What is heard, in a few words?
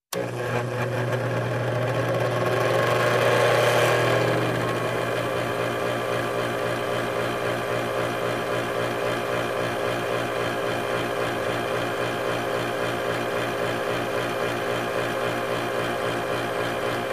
Motor Grinder Tool